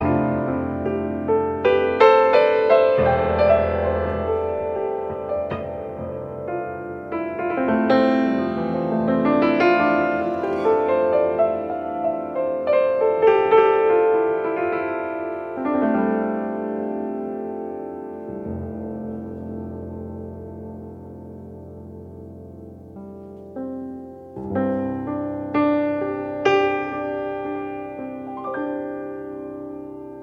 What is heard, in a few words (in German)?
Improvisation